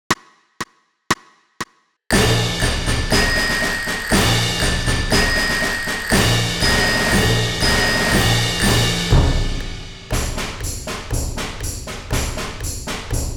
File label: with click